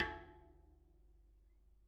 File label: Bicycle Spoke